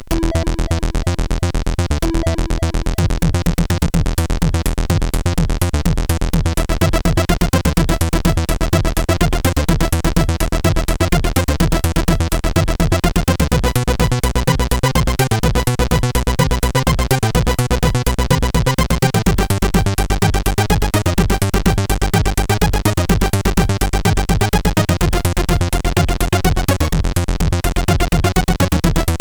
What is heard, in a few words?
Techno, CSG8580R5